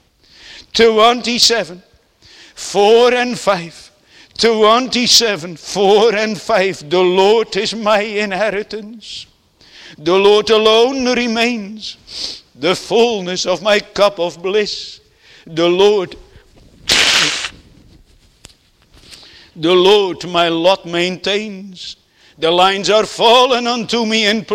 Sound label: Singing; 1 john 3 1 3